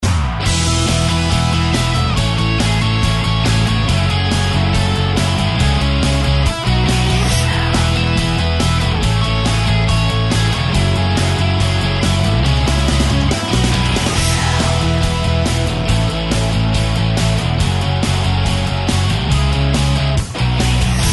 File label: Garage Jam - 140BPM, Rock - Regular